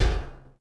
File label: cauldron Bump; objects; Star Wars Obi-Wan; XBOX